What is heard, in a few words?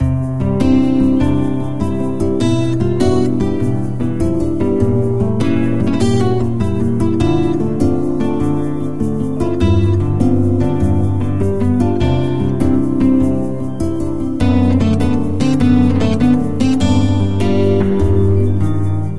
map screen music; 摇钱树